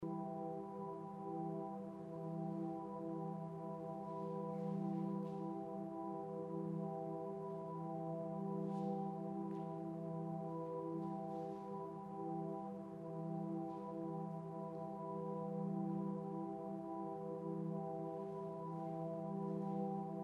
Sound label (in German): e-moll